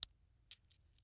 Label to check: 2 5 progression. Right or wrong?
Wrong